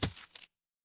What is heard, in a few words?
cartoon splat